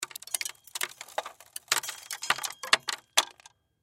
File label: Crash Debris Drop Metal Surface Small; Sound Effects:Materials; Blastwave